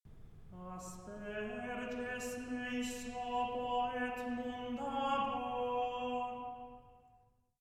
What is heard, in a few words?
Gregorian Chant; Miserere; Psalm 50; Asperges me hysopo et munda